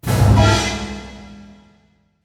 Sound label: HIT, full orchestra; Orchestral Loops; FULL ORCH ACNT